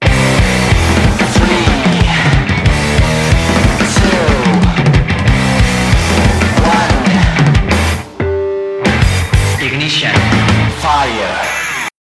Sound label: intro music; freak fortress 2; kenzo hale 2